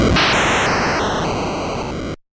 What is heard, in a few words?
finishing blow, attack sound; カタナ１